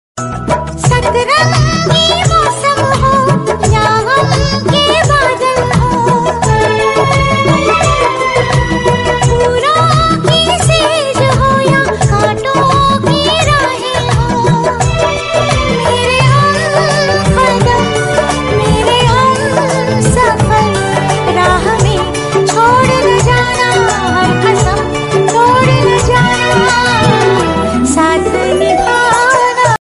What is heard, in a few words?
romantic hindi ringtone